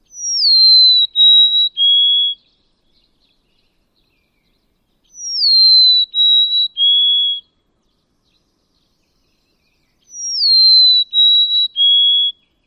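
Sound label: Golden-crowned Sparrow songs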